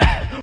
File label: vox crash, BeatBox Male